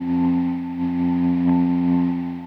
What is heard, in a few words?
EBow filter